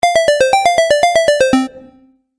Retro Game Melody; Video Game Sound Effects

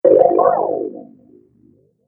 Magic Tokens Underwater, video game

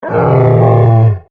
Monster Groan, Horror, Monster, Groan, Creature

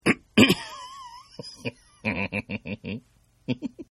Giggle Male; Laughter; Partners In Rhyme

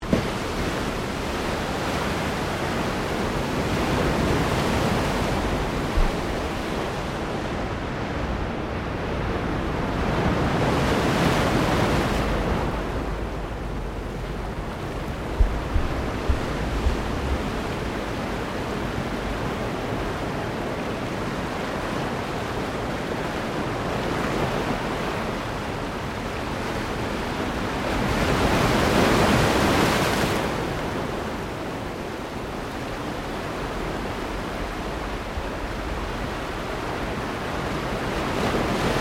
Water Ocean Waves On Sand Loop; Elemental weather effects; Nature

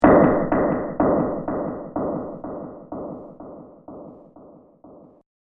Repetitive Impact Echo; Hit Sound Effects

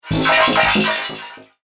Starfleet Coffee Machine; Spacecraft Sound Effects; science fiction sound effect

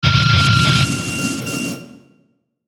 Feedback High Hum Buzz Delay; Feedback Sound Effects

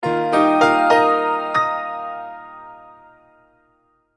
multimedia logo, Musical Identity/Signature

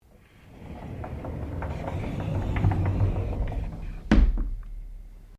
Door Sliding Interior Aluminium Glass Close; Various doors

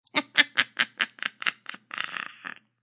Witch Cackle; Evil Laughs; Horror

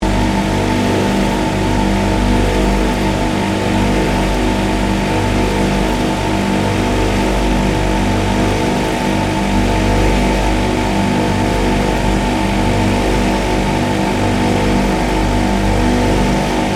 MACHINERY INDUSTRIAL PLANT ROOM OPERATING STEREO

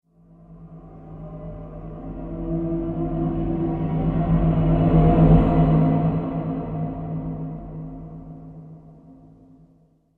Unholy Transition; Transition Sound Effects

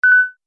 Multimedia Event Notification, beep sound effect

